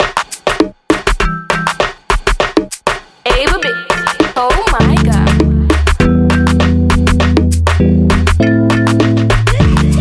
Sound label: Love bird Instrumental